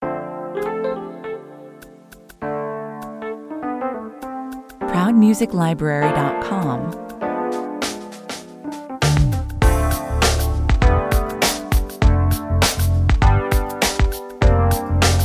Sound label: playing guitar on valentines day (variation) 100 bpm